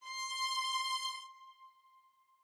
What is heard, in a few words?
strings